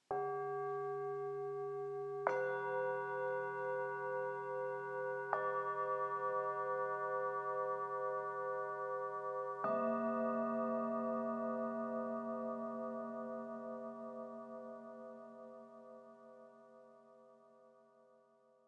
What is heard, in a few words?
Tibetan Bowls alarm melody; Mudita Harmony